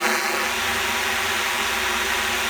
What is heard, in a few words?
alto sax D3; Xsample VOL-2